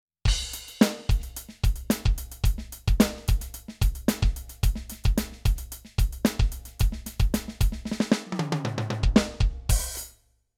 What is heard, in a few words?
Millenium MPS450 Room